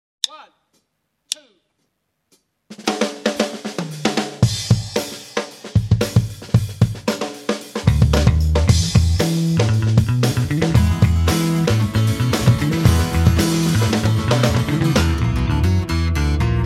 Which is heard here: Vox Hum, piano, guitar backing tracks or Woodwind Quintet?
guitar backing tracks